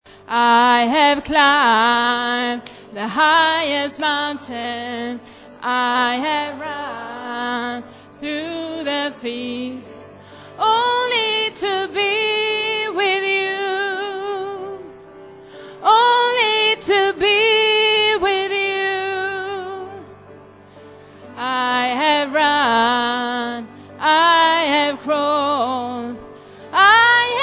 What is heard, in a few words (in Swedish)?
sopran